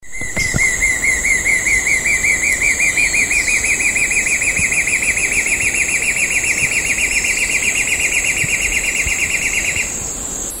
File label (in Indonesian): sempur hujan darat